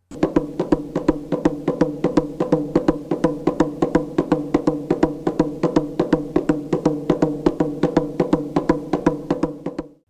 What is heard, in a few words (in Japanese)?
ンビティ・パターン（ニャウの太鼓合奏）; africa